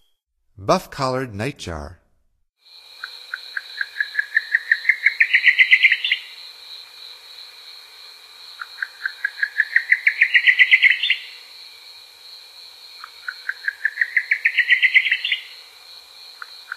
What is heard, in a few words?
Buff-collared Nightjar; North America; Stokes